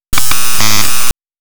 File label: glitch